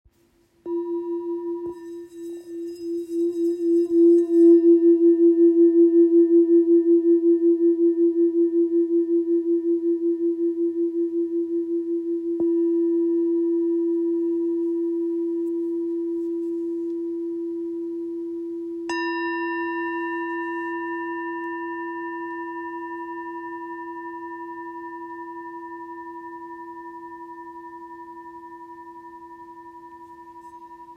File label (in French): Bol real FM Capricorne fa 850g